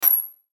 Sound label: Button Electronic Airy Squeak; Electrical Button; Multimedia